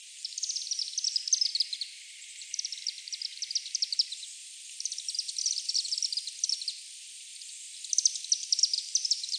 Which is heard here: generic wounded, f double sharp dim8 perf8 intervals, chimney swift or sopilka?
chimney swift